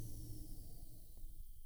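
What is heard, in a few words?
Music Dpad Evaporate 145; from dust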